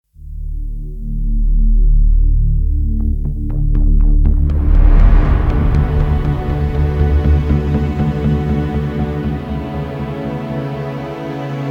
instrumental version

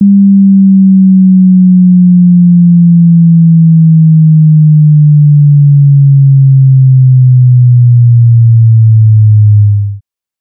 200hz 100hz sweep

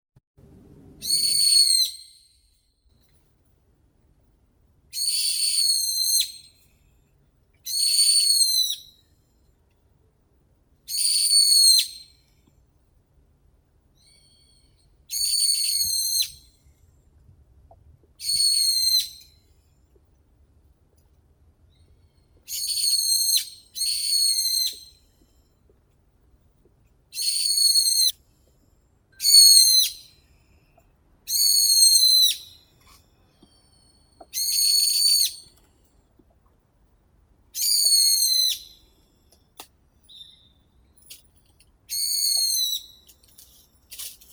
bulbul, Microscelis amaurotis; bulbul korotkopalii

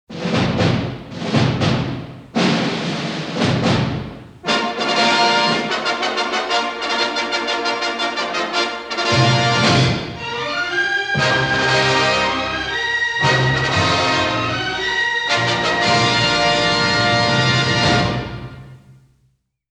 fanfare, symphony orchestra; 20th century fox

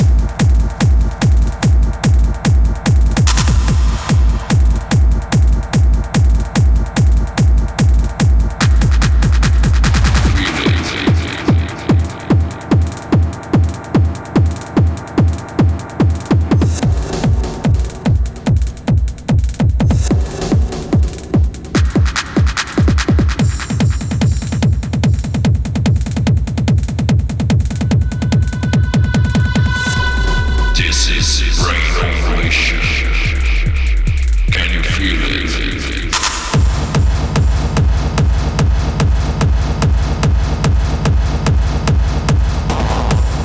kicks and bass acid step mix

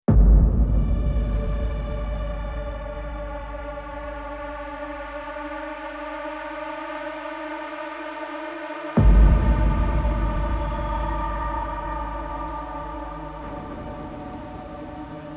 no choir

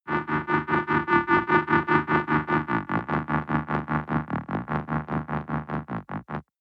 cement scrub; brand x music

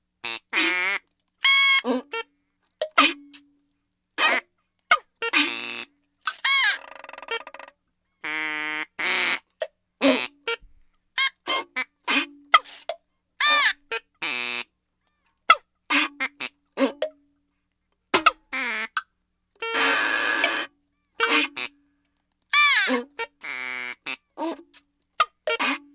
acoustic version

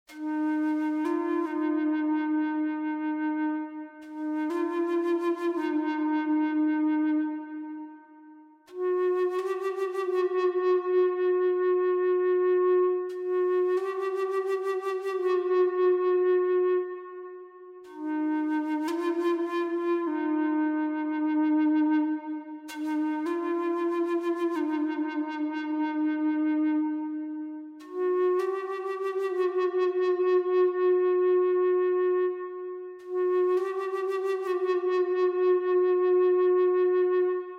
native american flute

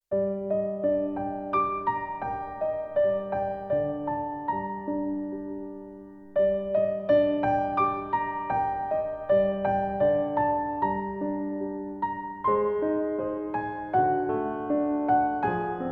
solo piano

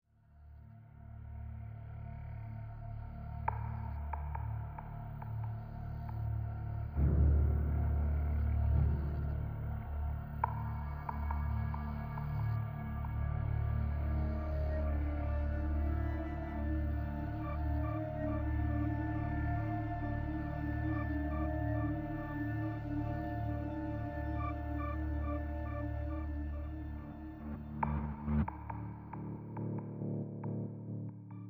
tension one shots

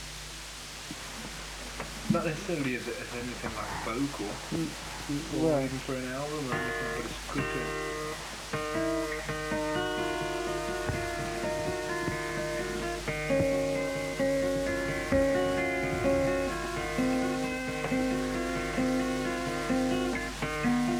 guitar instrumental

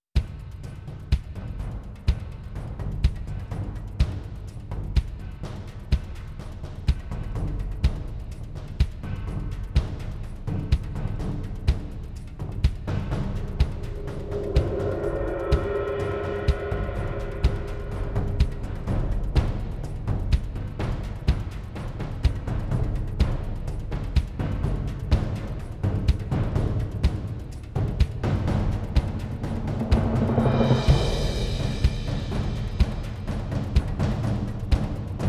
percussion only

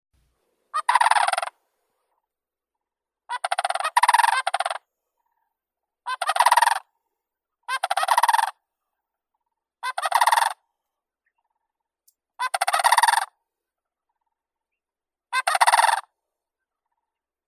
zhuravl kanadskii grus canadensis; zvuki prirodi

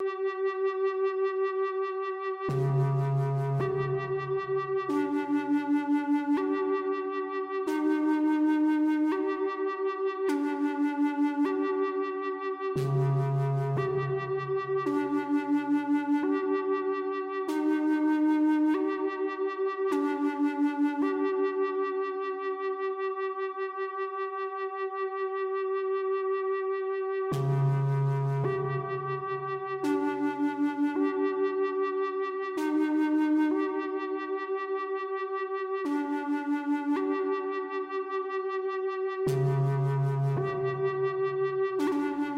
native american flute

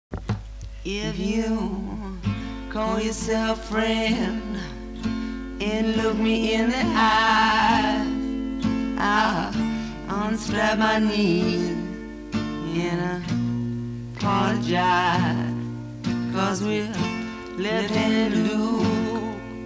acoustic

